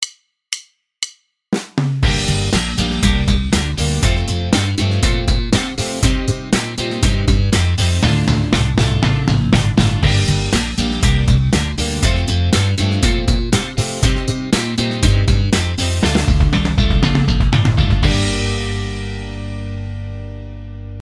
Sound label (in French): slap exercice, basse; exercices